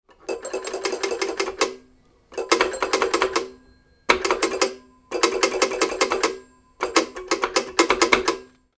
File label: Telephone Checking for Dialing Tone Old Bakelite Phone; Pushing receiver up and down